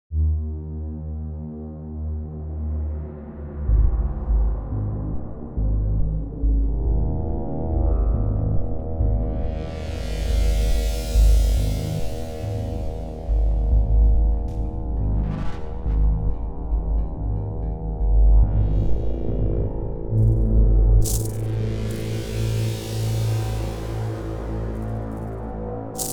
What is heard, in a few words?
dark mysterious terror ambiance